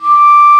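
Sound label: Clarinet p D5; Multisamples; Digital Sound Factory Virtuoso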